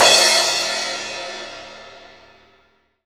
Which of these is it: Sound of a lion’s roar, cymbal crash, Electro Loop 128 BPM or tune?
cymbal crash